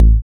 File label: kick beater